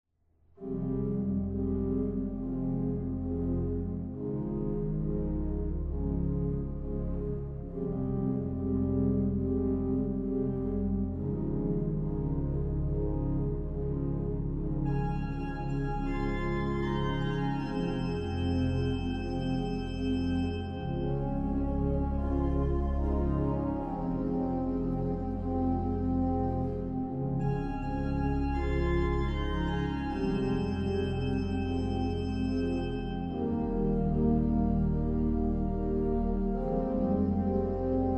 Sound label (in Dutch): Credo orgel